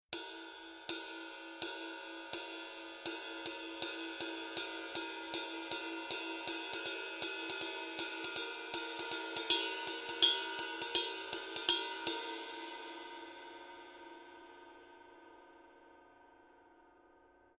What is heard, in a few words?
color sound 900 22 purple heavy ride pattern, paiste